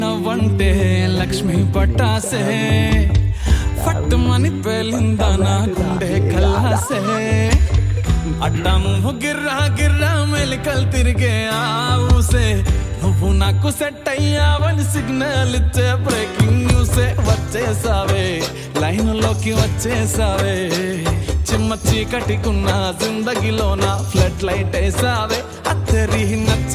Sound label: song telugu ringtone